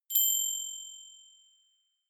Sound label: notification bell